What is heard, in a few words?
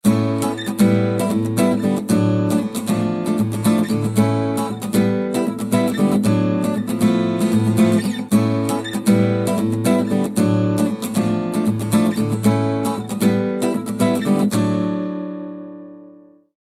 Samsung ringtone